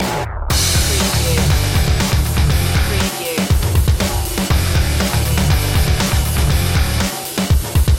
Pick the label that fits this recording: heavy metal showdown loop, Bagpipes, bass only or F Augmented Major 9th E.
heavy metal showdown loop